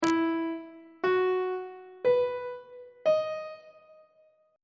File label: f flat major 7th suspended 2nd chord intervals; on treble clef